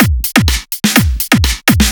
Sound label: Dholki Beat